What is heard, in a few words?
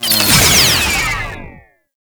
Mine Disarmed; Tau; Twelve Minute War